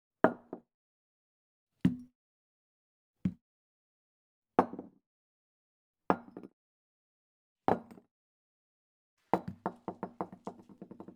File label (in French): BAR VERRE MANIPULATION POSER COMPTOIR BOIS